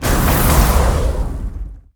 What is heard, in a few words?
sfx skill, ZombieSkill SFX; IdleRPG2024